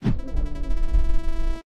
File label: right slowdown really hard; Pitch Black - A Detective's Demise